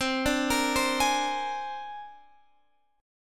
C 7th Suspended 2nd Sharp 5th C down Guitar Standard; C7sus2#5 arpeggiated; JGuitar